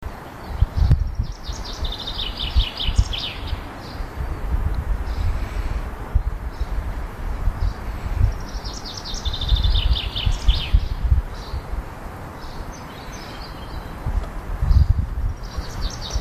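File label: zyablik voice, chaffinch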